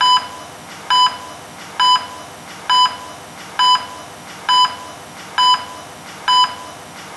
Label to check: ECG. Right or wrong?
Right